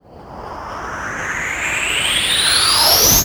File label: NOISE, Production Effects